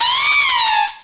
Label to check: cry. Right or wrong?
Right